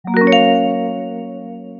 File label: click question